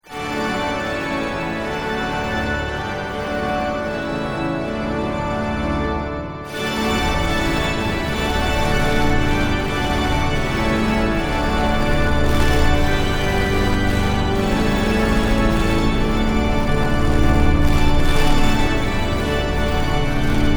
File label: Joy to the World MONSTER ORGAN with About 2,000 Ranks